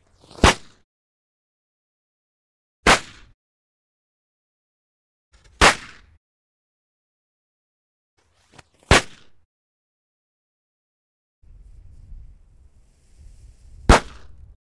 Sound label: Firecracker explosion; explosions